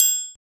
Perc

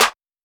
Snare, 808; Snares

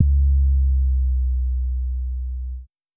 Twerk 808

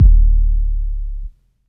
808's

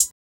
Hihat, Closed Hats

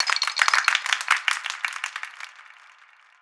clappin

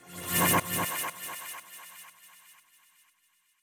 TRAP TRANSITIONZ, FX

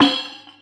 Snare Sizzle

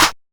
Clap; The Lunch77 Sound Design Kit (Vol. 2)

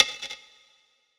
percussion static; Percs

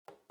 Wood, Percs

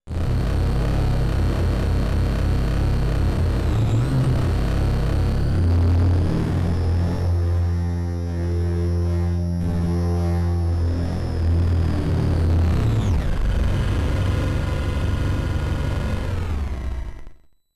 charged up, FX

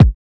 kicks

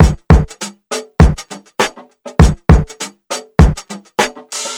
64 Beats

